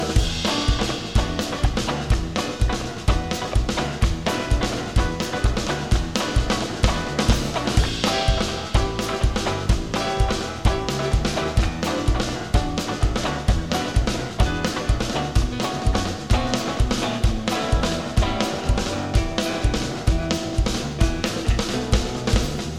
Uptempo Cut Shuffle 12 bar Guitar Piano Groove

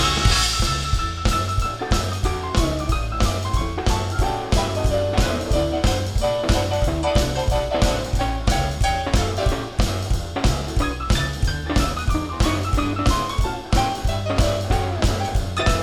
Uptempo Swing Shuffle 12 bar Piano Soloing

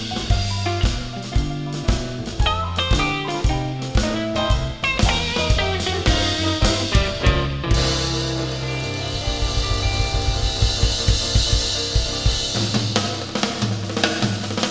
Medium Chicago Shuffle 4 bar Straight Ending

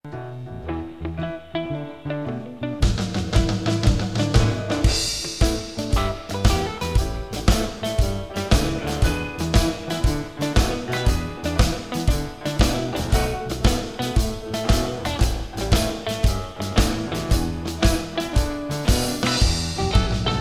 Slow Chicago Shuffle 12 bar Guitar Piano Rhythmic

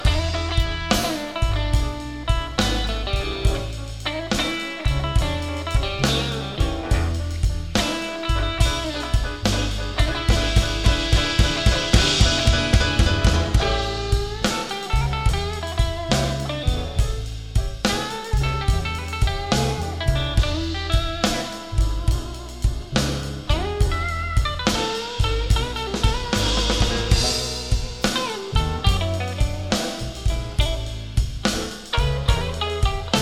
Slow Blues 6 8 feel 12 bar Guitar Soloing

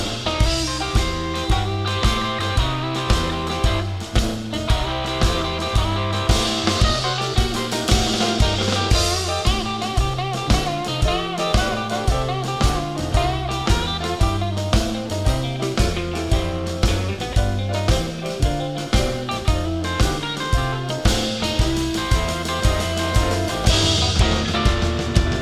Medium Chicago Shuffle 12 bar Guitar Soloing

